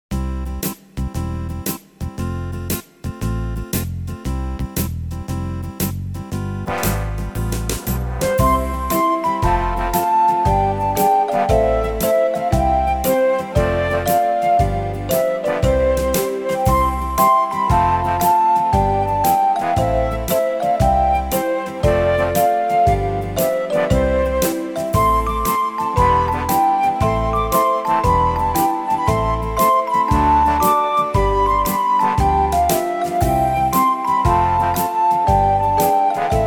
an english country garden blues

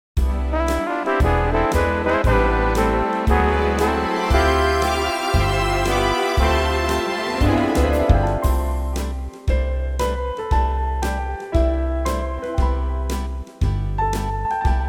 Foxtrot

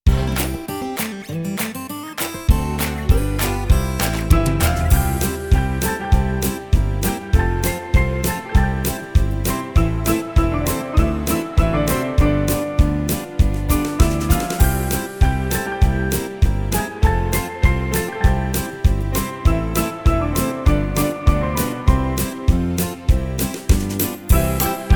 swing

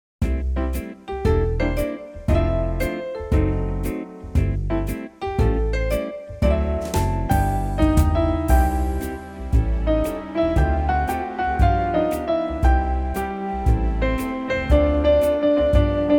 FOXTROT

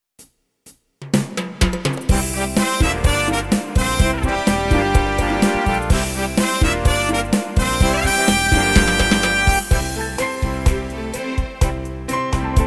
rock bottom cha cha, ballroom

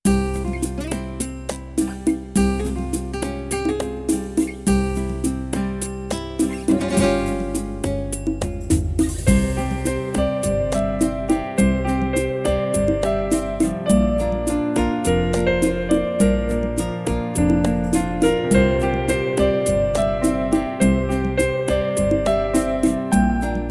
rumba, ballroom

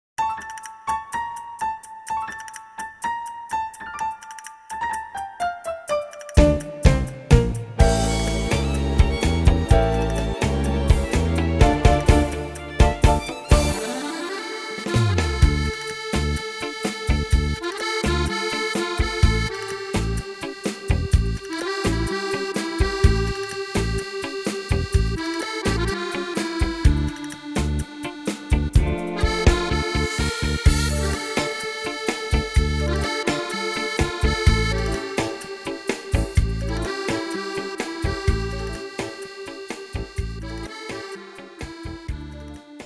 Tango

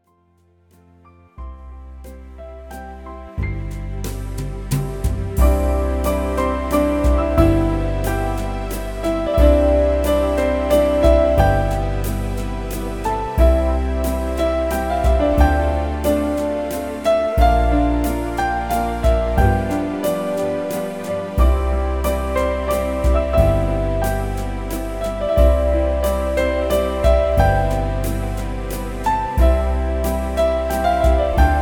WALTZ

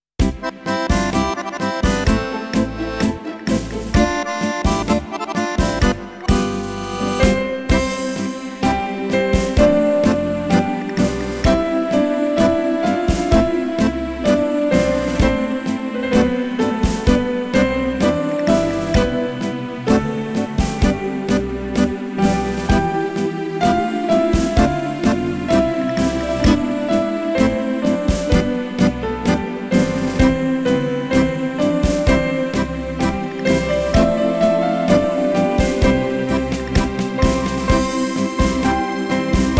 tango

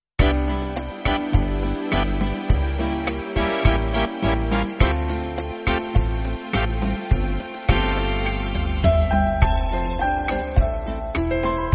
rumba

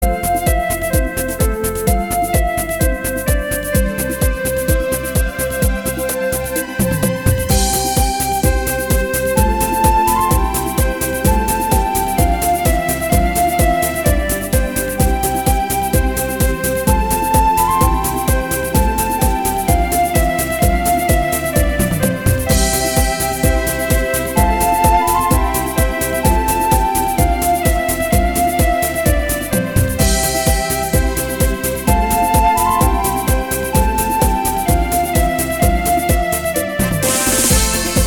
cha cha

